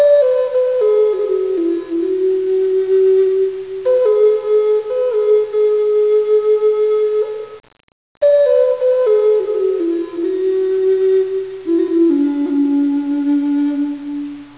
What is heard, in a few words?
luv maid flute